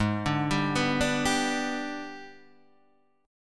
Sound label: Gsharp Minor Major 7th Flat 5th, Guitar, Open D